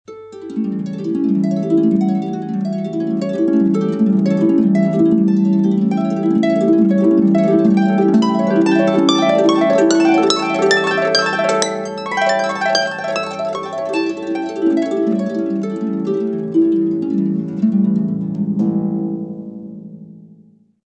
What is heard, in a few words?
harp solo; orquesta; Instrumentos